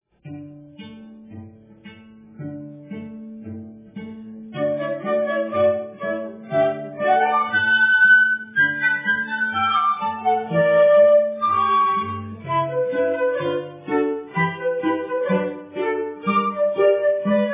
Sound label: Dance Reed Flutes